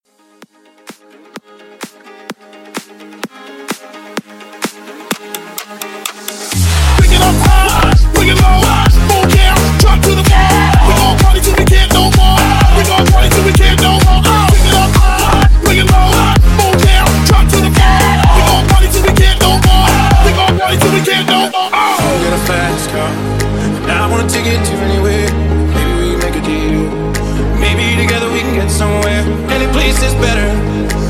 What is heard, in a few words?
Mixshow Hype 128